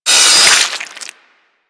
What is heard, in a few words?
Flesh Impact, Weapons; Warframe; Tenno; Miter; Hit Effects